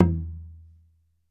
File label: TUBOB, Ritual Drums; E-MU Producer Series Vol. 7 – Old World Instruments